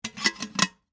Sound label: Teapot Lid Close, Household, Teapot, Lid, Close, Empty, Metal; Kitchen; BlastWave